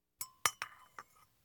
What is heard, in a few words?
Household Phones